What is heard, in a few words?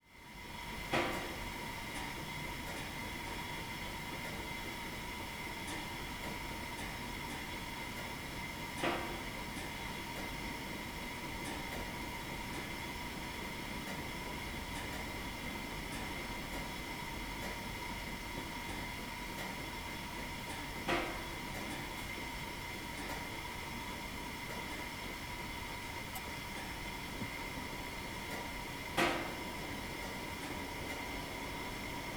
Room Tone Wall Heater Furnace, Pinging, Hiss; Stereo; Surround a Room 5.1; Room Tones